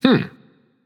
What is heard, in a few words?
notice; smite; achilles